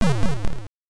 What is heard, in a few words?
Coin; Halley's Comet; SJ System; arcade